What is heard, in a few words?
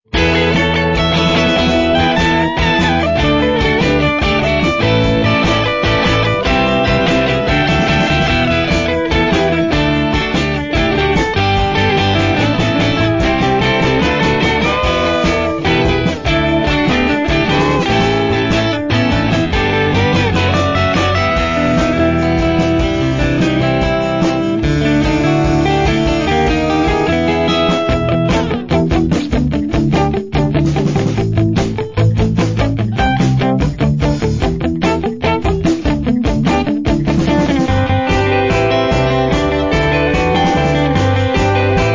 Motivational